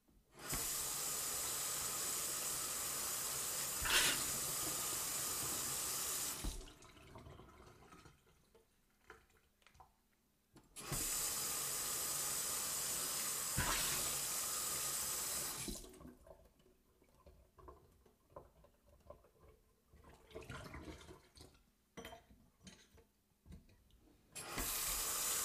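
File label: Washing Hands Sequence, splashes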